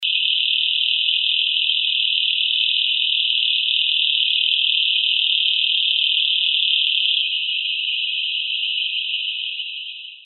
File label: Boophis anjanaharibeensis